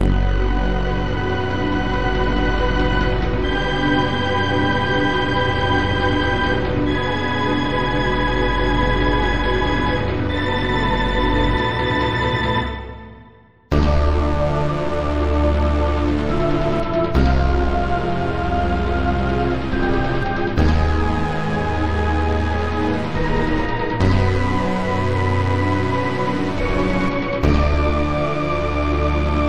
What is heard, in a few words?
Battle, bgm